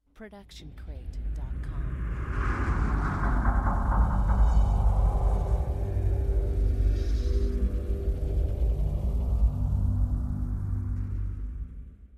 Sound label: horror dark void; soundscrate